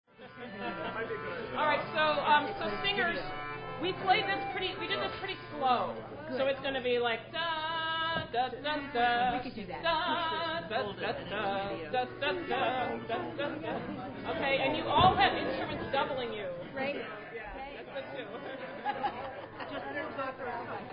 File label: Concertina Band; 2010 Concert; Hallelujah Chorus Setup